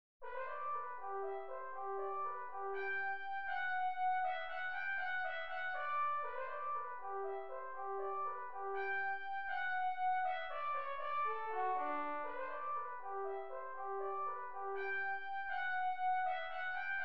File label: gigue sol; telemann georg philipp